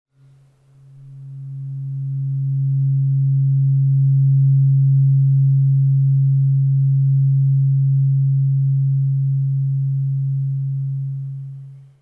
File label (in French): om 136.1hz diapason thérapeutique; medson